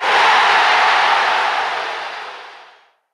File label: call yeah long